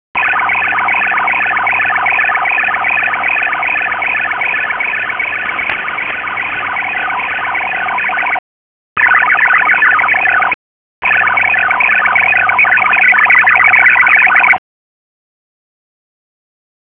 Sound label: AUTOMATIC PHONE PATCH OPTION; ROHDE & SCHWARZ GN2100